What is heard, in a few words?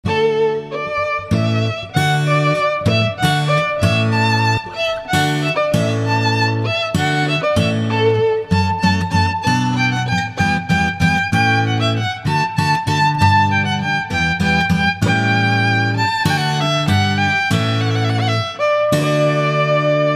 hornpipe violin; violinduo